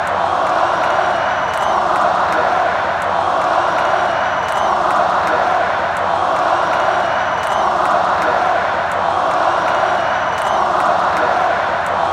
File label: all hail, fan chants; cale